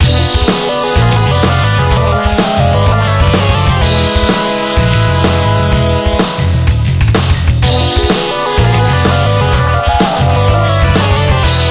Level Cleared, Music; Jets 'n' Guns

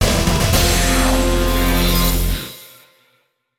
Evil Jingle; Paper Mario - The Thousand-Year Door (GC DSP)

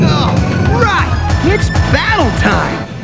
battle; Cartoon

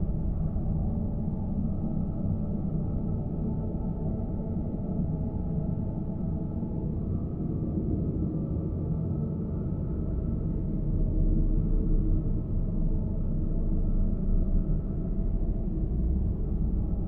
Ambience; Ruin of the Jedi; Lego Star Wars (PC)

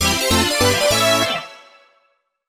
Item Get; Paper Mario - The Thousand-Year Door (GC DSP)

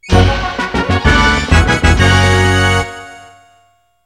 Bonus Complete; Super Smash Brothers

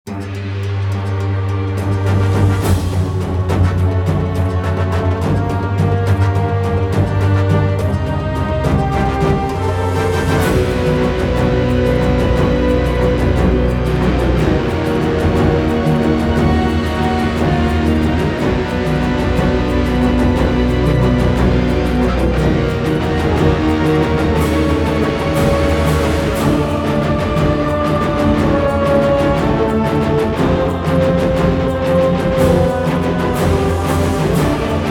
Drums of the Dead; Icewind Dale Soundtrack (PC)

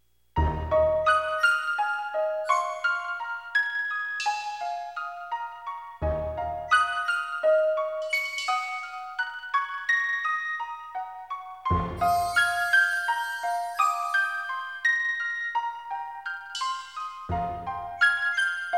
Game Clear; Super Smash Brothers

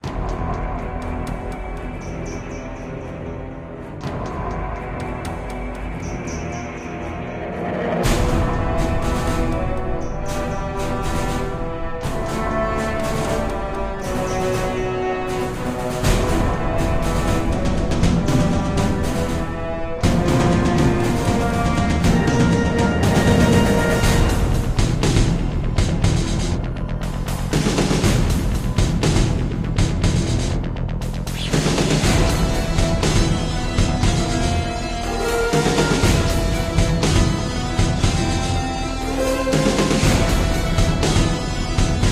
Menu Music; Unreal Tournament 2003

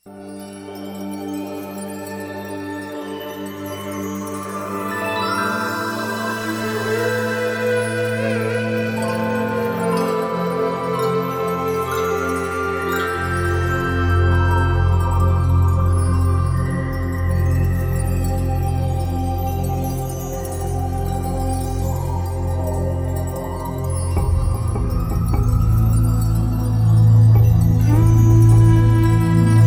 Level Music; Ecco The Tides of Time (CD)

